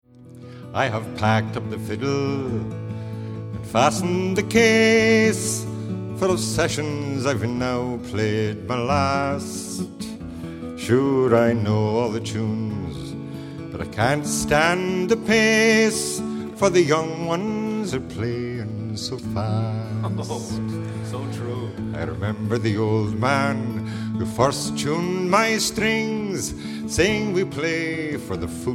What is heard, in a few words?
Fiddle; Fiddle Farewell